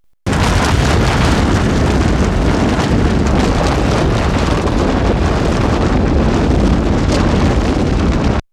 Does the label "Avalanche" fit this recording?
Yes